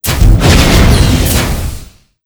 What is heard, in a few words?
gate deth